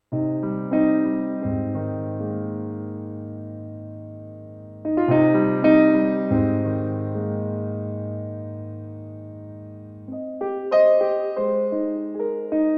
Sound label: Piano